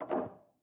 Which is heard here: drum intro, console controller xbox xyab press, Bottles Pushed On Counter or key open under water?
key open under water